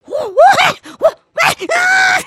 Escaping Yell

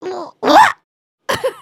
trip, emotes

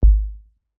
generic THUD; aronwk collection; ndaudio

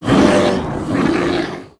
Thwok yell; Summoner

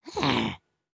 Idle Look Up; Lego Strombie

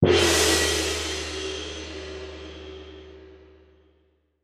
gong

